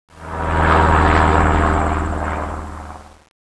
click

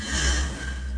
Eye Breath; Macworld (1997-11); Games World; Shareware Games; Arcade; Peng! 1.0

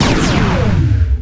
new laser; Earth and Beyond; EA Games

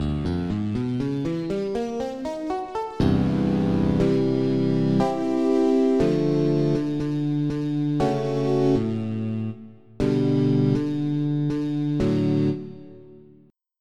Stick Combo, chapstks; sfont2

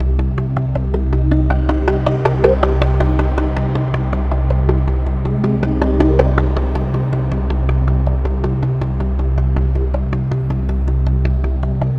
AMBNT 160; Ambient

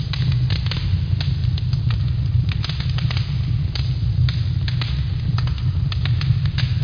Fire Low; RivalBall for Macintosh

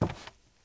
carpet; ambient

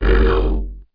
CANCEL

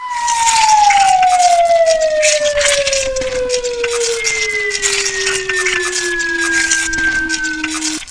Squash slide; Word Wizard Games

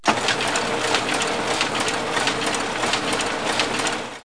press; KABOOM! - Wild and Wacky Sound Software; industry; equipmnt

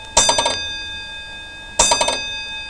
ding beep, cartoon sfx; Sound Effects for Multimedia - Volume 1

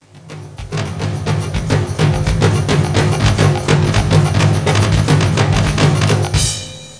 Beat; RhinoFX TimeRift

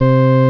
Organ Sound; IQ2 Demo

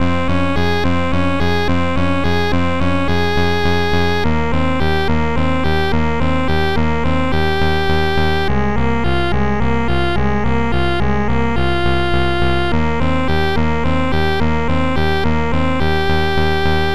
violin; SoundMOD Volume 2

